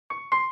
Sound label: Piano Version